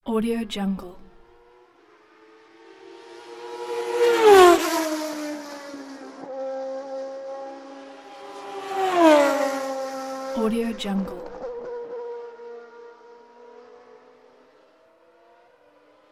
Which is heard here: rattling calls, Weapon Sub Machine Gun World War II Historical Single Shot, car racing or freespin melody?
car racing